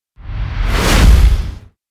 Motor Wipe Wipe; Ninja Tracks

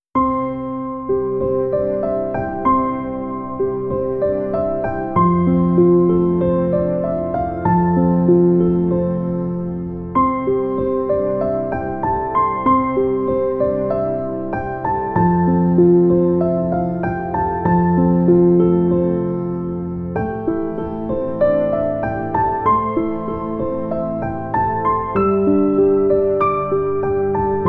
Best for Relaxing, Piano for Sleeping Babies

